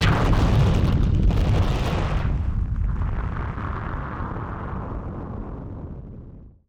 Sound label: Firework FX; musicradar; bomb factory